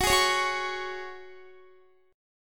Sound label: Gb Suspended 2nd, Guitar; strummed